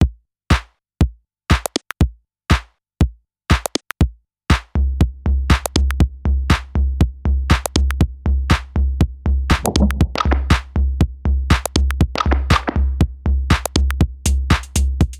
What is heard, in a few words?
Digitakt Sample; Digitakt Sample Pack 2 Demo